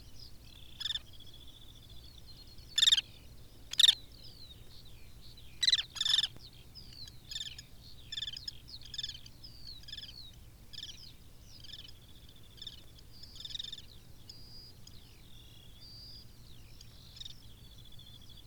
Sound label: Collared Pratincole Glareola pratincola pratincola, collared pratincole call